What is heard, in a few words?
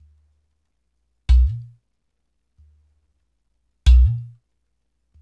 93 Brk Dr Reggae; Spectrasonics; Supreme Beats; World Dance